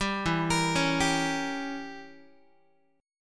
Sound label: E Diminished 7th E, Guitar; Edim7 arpeggiated; JGuitar